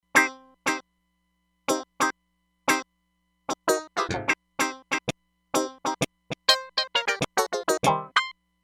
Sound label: Funk Chank